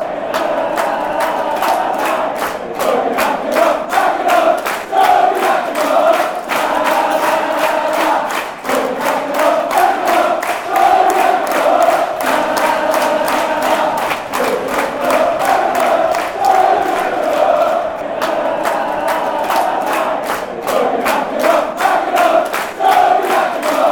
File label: reading chant